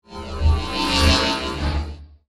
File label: Scanner Beam Heavy, Scifi; Science, Fiction; Tech